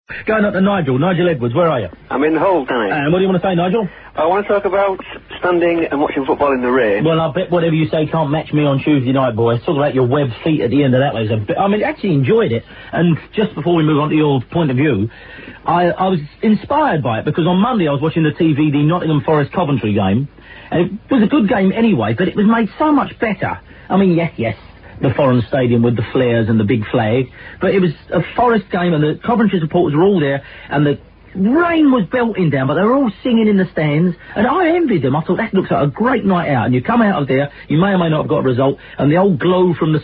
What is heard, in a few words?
rain